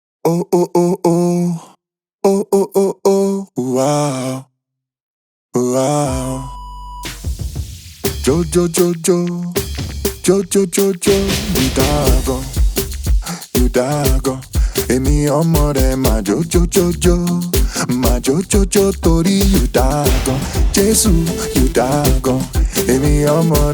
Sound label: gospel version